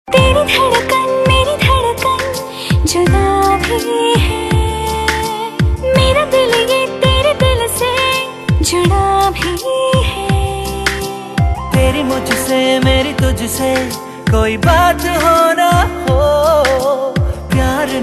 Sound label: Title Tune; TV Serial Tone; Sony